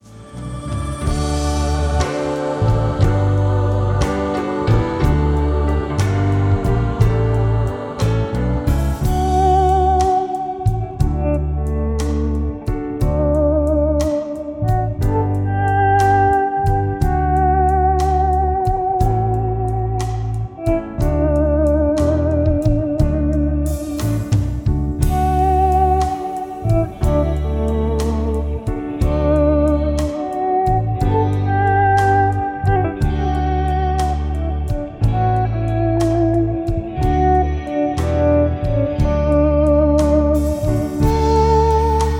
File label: Relaxing Guitar Dreams, Instrumentale Gitarren-& Panflötenmusik